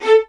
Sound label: A, LOUD VIOLINS; Q Up Arts - Denny Jaeger Master Violin Library